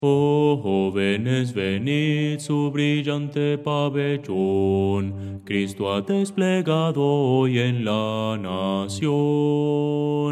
bajo; oh jovenes venid

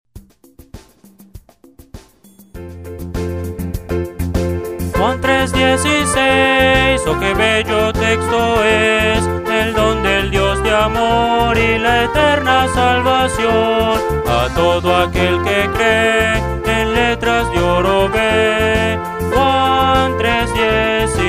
Juan tres dieciséis, Himnos Cristianos

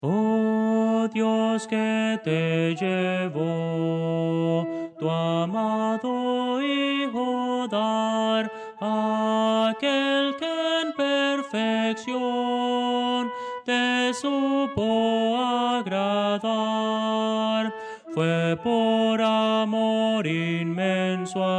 soprano

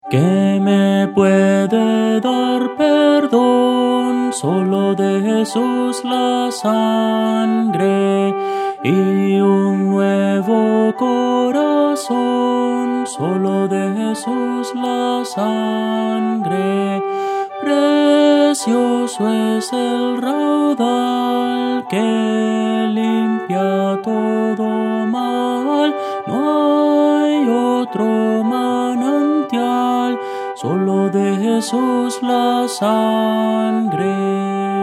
melodia, himnos cristianos

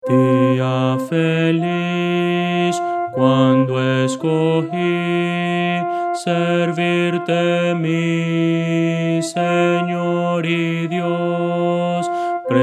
contralto, himnos cristianos; dia feliz cuando escogi

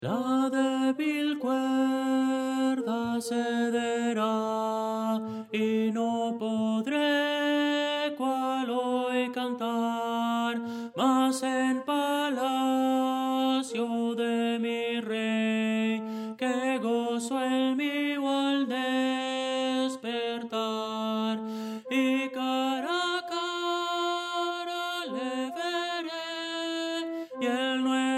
tenor; la debil cuerda cedera; Voces para coro

